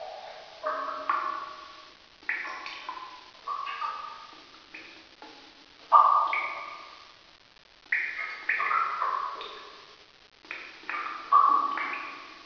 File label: drips, ambience